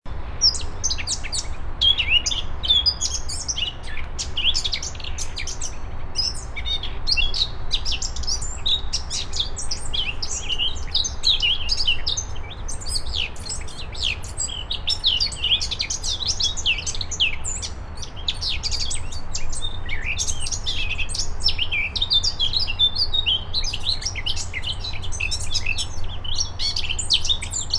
Sylvia atricapilla; SETE

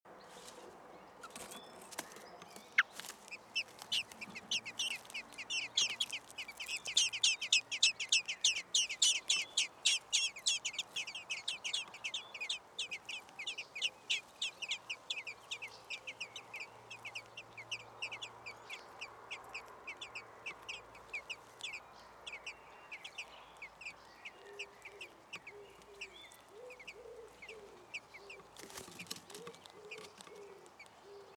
Turdus merula; SETE

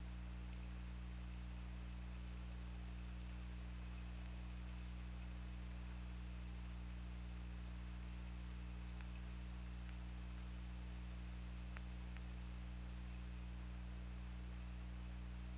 Orca; OrcaLab

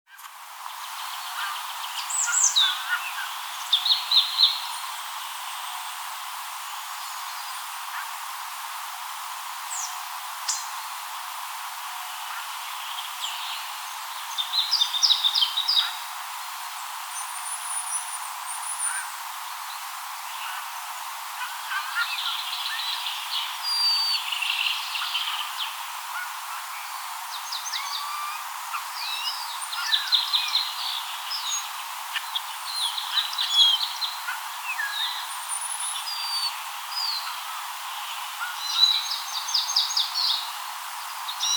Garrulus glandarius; SETE

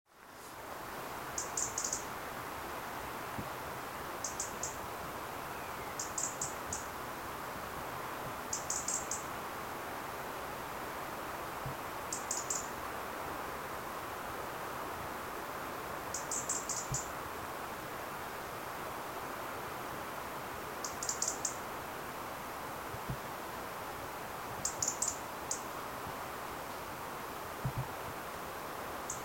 Erithacus rubecula; SETE

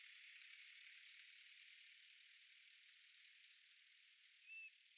DRONES

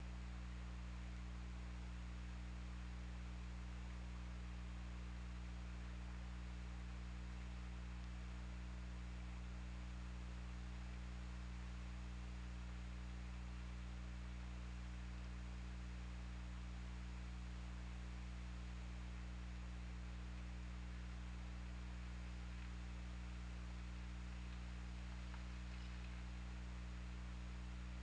Orca; OrcaLab

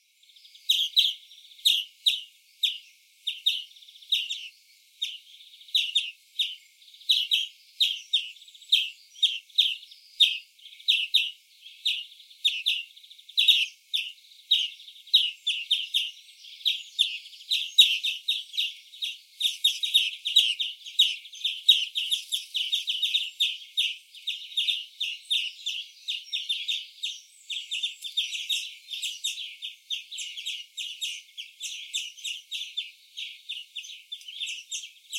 Chloris chloris; SETE

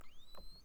call, globi

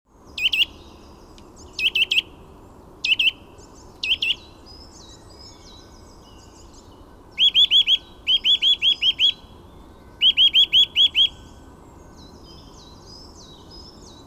Sitta europaea; SETE

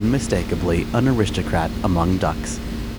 noisy

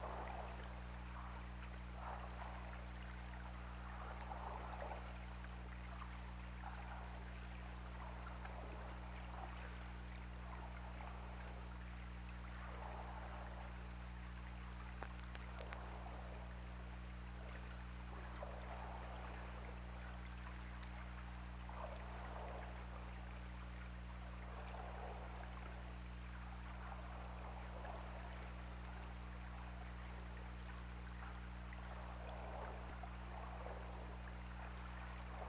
Orca; OrcaLab

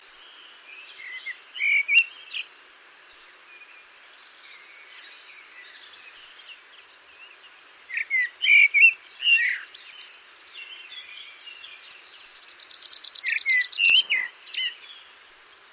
Turdus merula; SETE

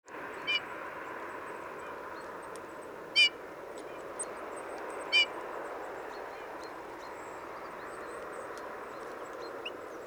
Pyrrhula pyrrhula; SETE

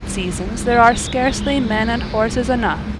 noisy

